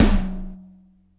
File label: Toms, Real